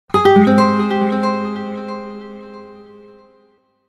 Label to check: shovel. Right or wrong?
Wrong